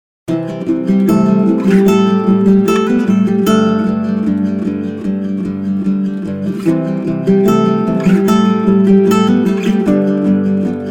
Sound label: Emotive Indie Guitars Acoustic